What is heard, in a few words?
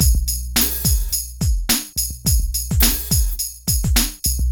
PHATT BEA, Hip Hop